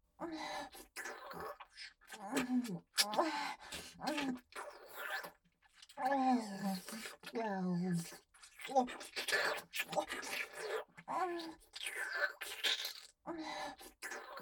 ひたすらペロペロトリプル舐めフェラ; ユキと梅雨子と霧江

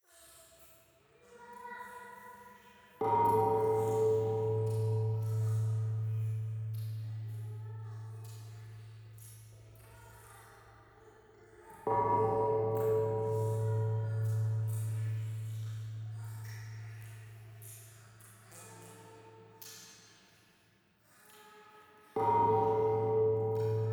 廃墟 イラマチオ 神社

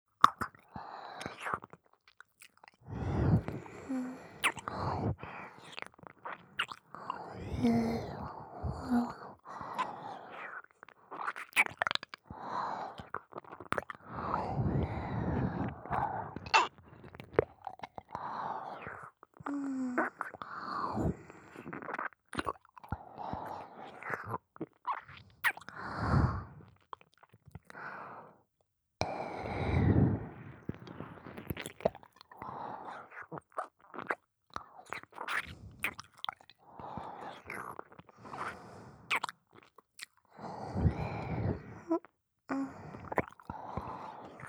耳舐め, ASMR

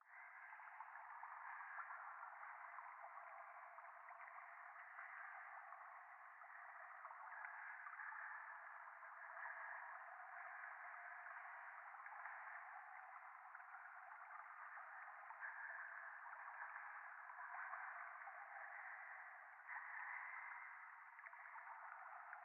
ゴースト 右耳快感吐息 川のせせらぎ

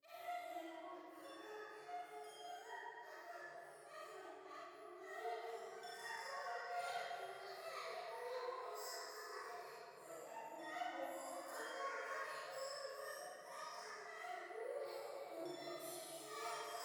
喘ぎ 寺; 夢の中

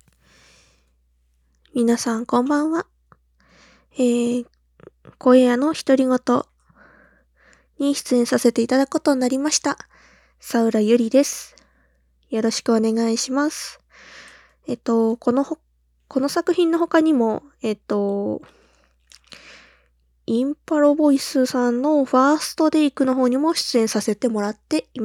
プライベートオナニー実演; 声屋のひとりごと

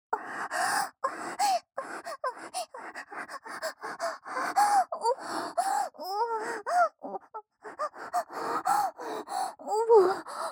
おほ声

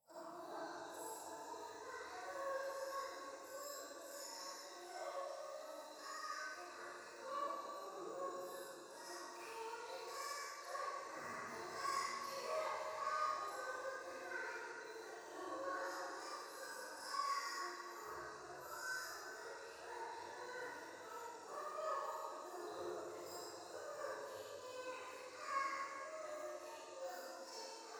夢の中 低音喘ぎ 山奥夜

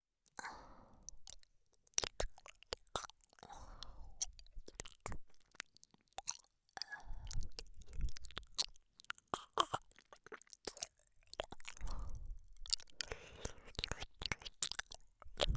耳舐め, ASMR; SEあり

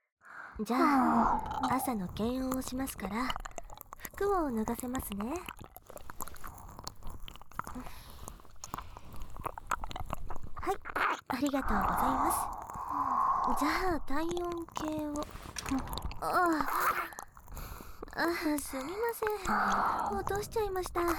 耳舐めされながら、むちむちドすけべナースにフェラ、素股をされてじらされる音声, ASMR; 26分間耳舐めされながら、むちむちドすけべナースにフェラ、素股をされてじらされる音声

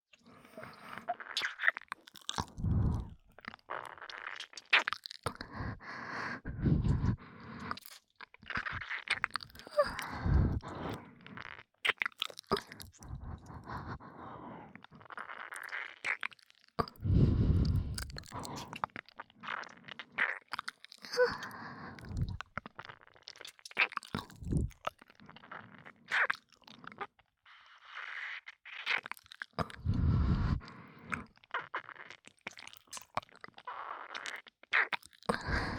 吐息 たっぷり まったり 耳舐め; 白耳; くちゅ系効果音版

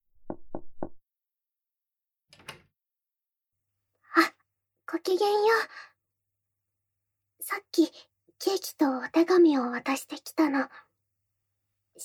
耳かき; 猫耳メイドで耳かき

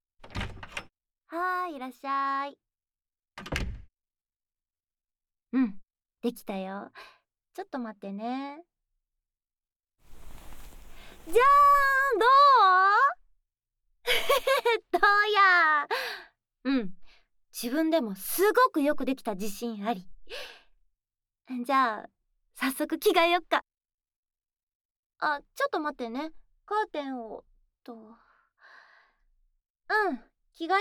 着替え・撮影ASMR; カーテン越しのドキドキ

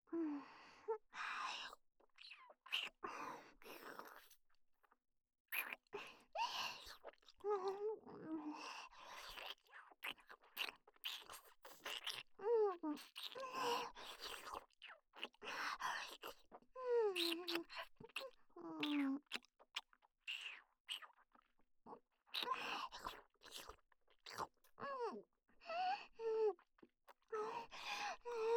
キス ループ

